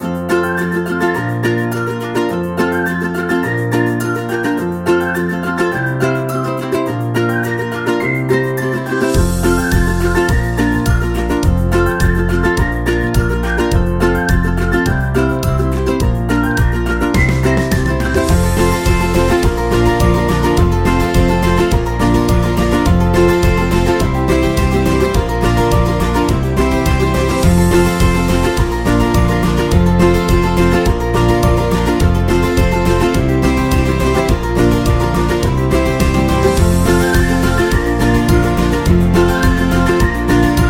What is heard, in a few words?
Happy Birthday Loop